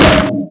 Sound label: Metal Collide; XBOX; Amped 2